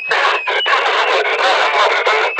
Death; Warframe; Lasrian; Battle Barks

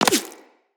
Bullet Impact Flesh; Weapons; Bullet Impacts

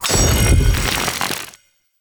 Spear Impact

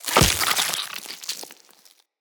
Root Spider Web Shot Proj Burst; Animals; Spiders; Abilities

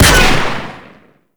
Sentient Pistol Shot Primary; Warframe; Amalg

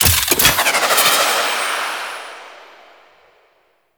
Space Detach; vehicles; Archwing; Warframe

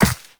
walk ice, footsteps; Warframe; Operator

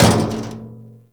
Physics Barrel Impact Medium, Metal Barrel; Warframe; Physics Objects

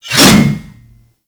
Axe Swing; Warframe

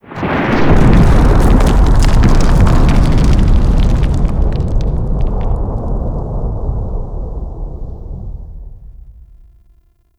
Golem Explosion Rumble; Warframe

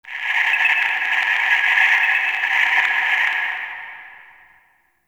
Dojo Tech Beep; Ambience; Warframe; Mod Quest; Teshin's Hideout

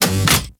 Gas City Claw Lift End; Warframe; Corpus

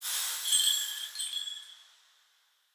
Mote Chatter; Motes

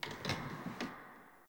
Bar Wooden Chair Move; Warframe; Nineteen Ninety Nine; Hub; Hub Pub

